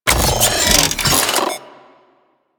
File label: Turret Deploy; Warframe; Corpus; Corpus Railjack; Admiral Agent